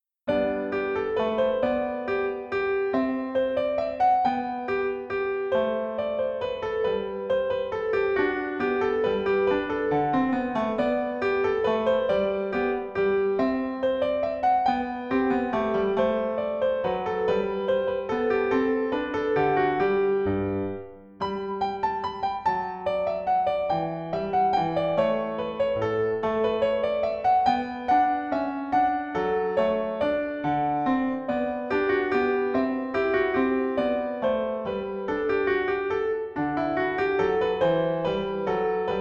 Minuet in G major; BWV Anh 114; Petzold

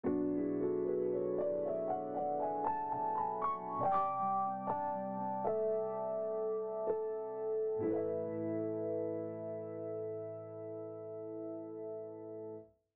lofi

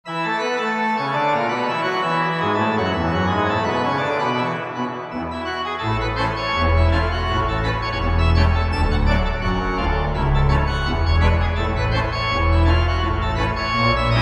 morning with Organteq; Organteq - Intensively and focused; Happy morning with Organteq; Pianoteq

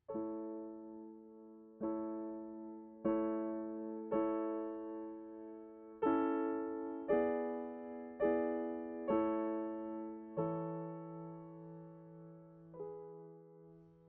Note FX; Burgmuller; Ave Maria Grot

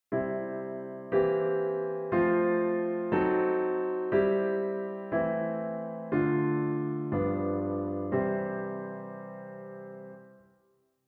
Steinberg Model D

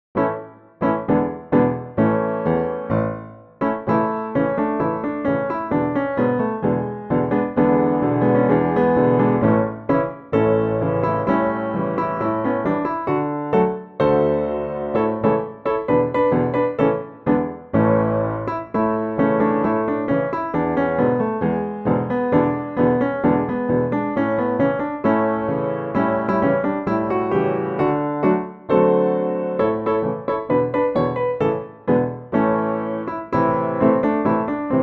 waltz

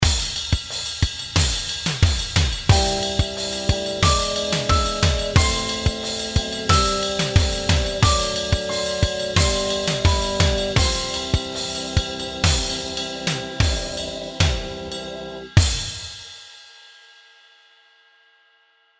Rall and Accel

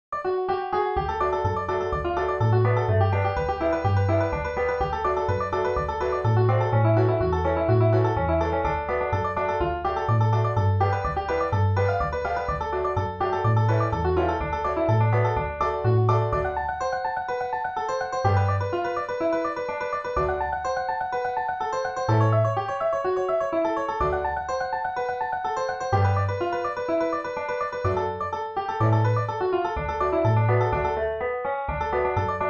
Bass Banjo

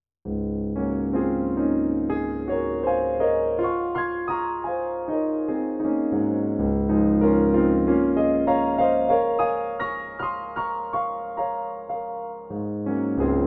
Reflets Dans L'eau

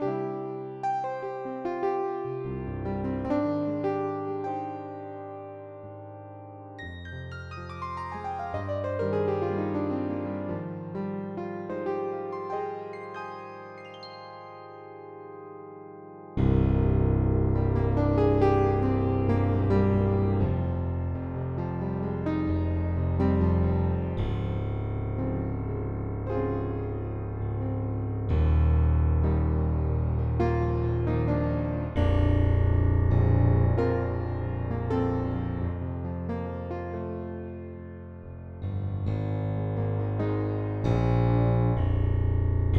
piano; Syngular

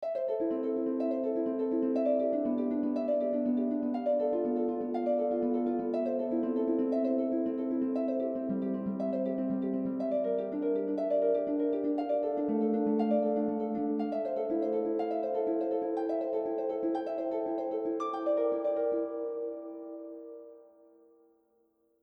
Inversion for harp

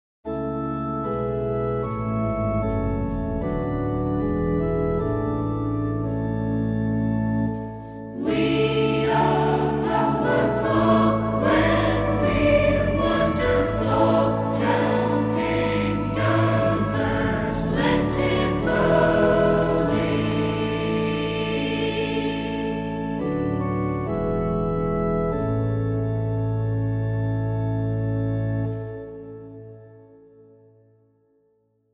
Choral Organ Accomp

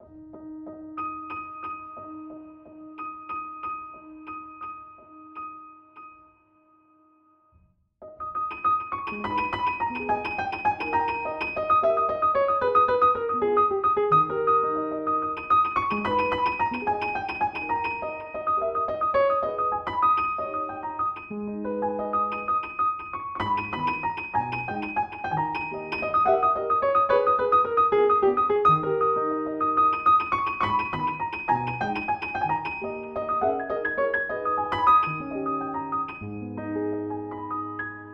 la campanella

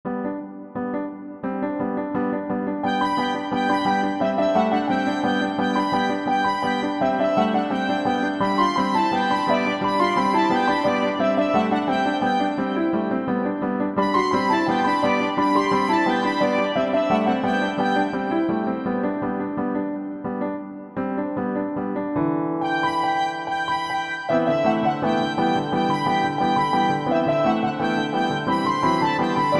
Bag-pipe tune

